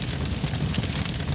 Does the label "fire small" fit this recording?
Yes